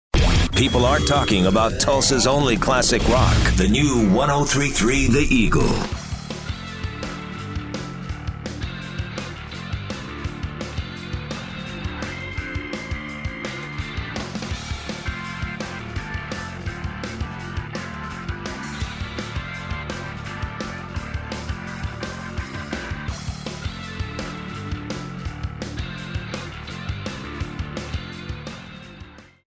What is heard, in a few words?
Listener Promo Open - Bed